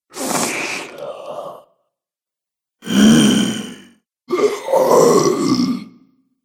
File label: Zombies Selection, Zomby Sound Effects; horror